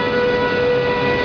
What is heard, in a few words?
run; finnish